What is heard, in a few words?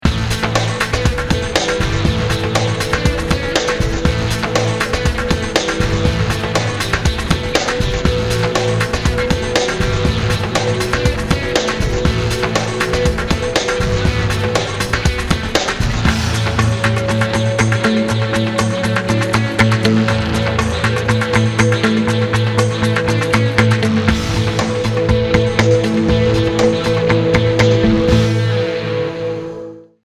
Agressive Nature Alt Gtr